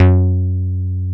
GRUFF F#2, BASSES; Best Service Alpha Dance vol.2

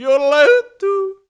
Yodel M Vox; Roland BK-5

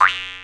jews harp B; E-MU Formula 4000 Series Vol. 5; Protozoa; Proteus3 Presets

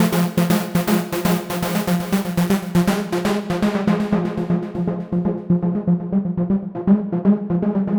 Trance Synth

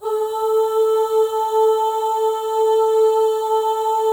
OH, FEM, Symphony of Voices; Spectrasonics

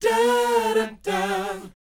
BOYZ E, Vocal Planet, R&B Dance; Spectrasonics Vocal Planet

